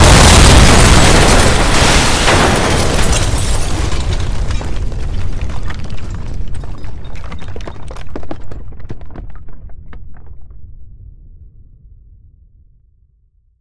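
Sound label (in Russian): сильный взрыв старого дома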